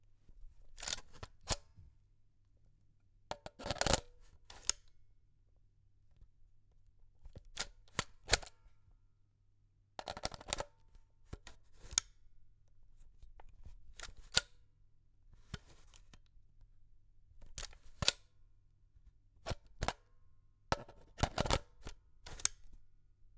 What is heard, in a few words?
Old Slr Manual Lens Taking Out And Putting Back In Camera